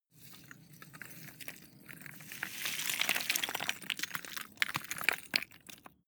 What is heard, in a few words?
stone small landslide